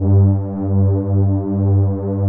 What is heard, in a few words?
smoothies, pads; Pro-Rec - Synthsonic